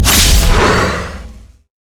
steam golem overdrive; LoL; blitzcrank

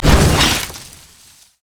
bushwhack set; nidalee base

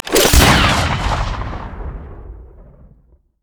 quickdraw; LoL; yasuo skin01

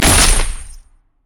bola strike; LoL; hunter rengar

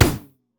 turret; LoL; heimerdinger; uranium

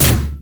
light strike; LoL; lux

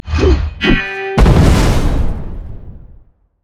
death; LoL; mordekaiser

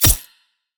lunge oh; LoL; fiora

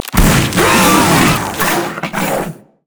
frost recall windup; LoL; shyvana

